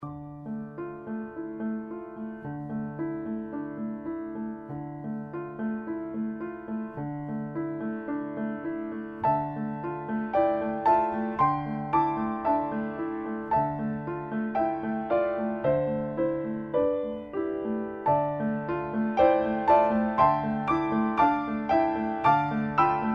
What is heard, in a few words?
melody, concert organist